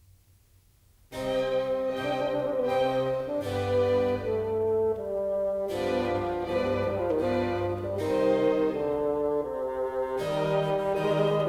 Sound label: Bassoon Concerto; Vivaldi; No14 RV480